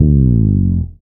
slides, bass; Spectrasonics; Bass Legends CD 1

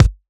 Kick, Kickdrum; Equipped Music; Loungin House

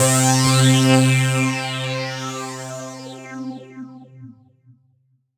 C3, TECHNO FX, Roland JV 2080

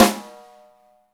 SNR, Snare; Club-50 - Foundations Roland; Ext.Snare

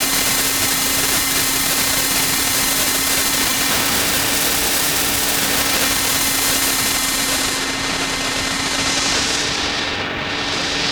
mad radio, special FX twisted

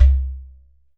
GHATAM

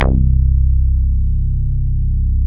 Tekkno Bass; Best Service Gigapack 2

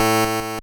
Buzz; Bitkits

